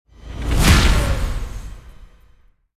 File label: Boss Skill Storm Fall End; starbites